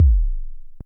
korg trident

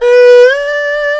kokyu D3; AKAI S6000 CD-ROM - Volume 1; Strings

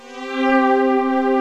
LINEAR SYNTH

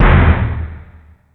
FX Sounds, Drum Machines

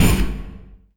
Jungle Oneshot; Sonic Foundry (Sony Creative Software); Esoterik Beatz

